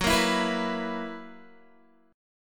Gb 11th, Guitar

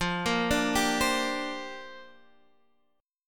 G Minor 11th F

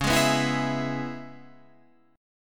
C sharp Minor 7th C sharp Guitar Standard; 9,11,9,9,9,12; strummed; capo=7

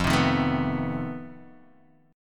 F 7th Sharp 11th F; F7#11 strummed

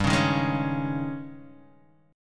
Ab Major 7th Suspended 4th G

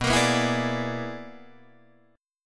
B-Minor Major 13th B down Guitar DADGAD; strummed

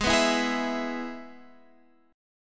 Ab Minor Sharp 5th Ab, Guitar Standard; strummed; x,11,9,9,9,12

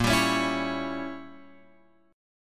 A sharp Diminished 7th, Guitar Open F; strummed; JGuitar

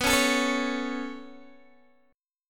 B 7th Suspended 2nd B down Guitar DADGAD; B7sus2; strummed

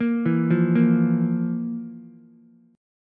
F Major 7th Suspended 4th F, Ukulele; FM7sus4 arpeggiated; JGuitar

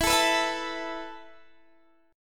E Suspended 4th E, down, Guitar, Half Step Down

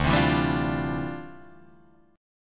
D Minor 11th D down Guitar Drop D

